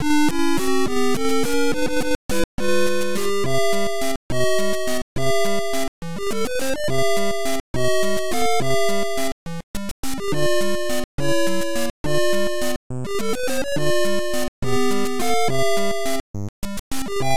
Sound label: Underwater theme; SMB Covid 19 Edition